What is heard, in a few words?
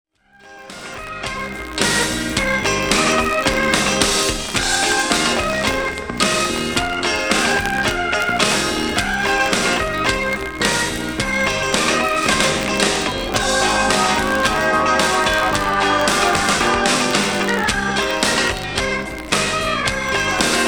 BLUES